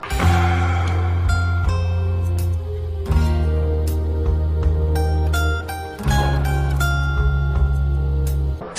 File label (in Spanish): musica triste